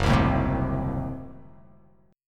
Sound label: F sharp Diminished 7th F sharp